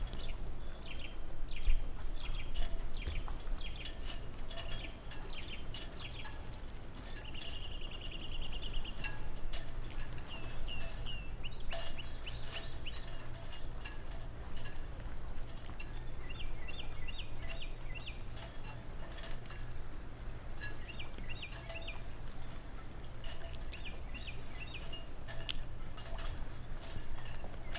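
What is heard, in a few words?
port ambience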